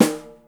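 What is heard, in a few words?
snare bh, Yamaha Motif ES drum machine; 300 Drum Machines; Yamaha Motif ES-Series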